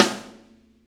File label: SNARE hard, Drum Kits; Big Fish Audio - DOM; Redrum